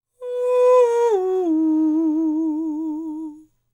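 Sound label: falsetto, Vocal Planet Jazz FX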